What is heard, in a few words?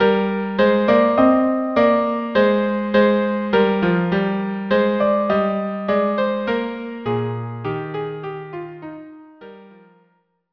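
violin cello; La Tranquillite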